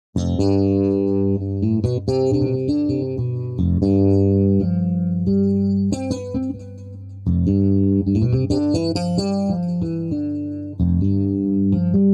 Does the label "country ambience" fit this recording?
No